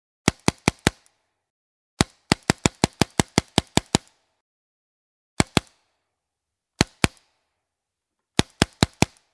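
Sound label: paintball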